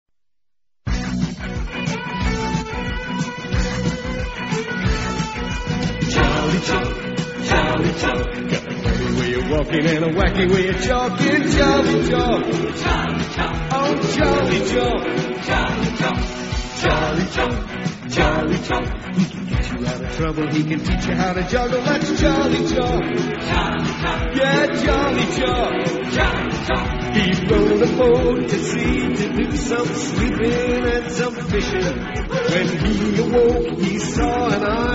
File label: Theme Tune; Charlie Chalk